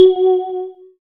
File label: SYN WATER D, Microwave; Sound & Vision - Gigapack I CD 2